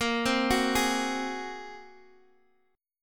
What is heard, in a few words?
Bb 7th Suspended 2nd Sharp 5th Bb down Guitar Open D